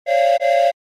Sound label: Toy train whistle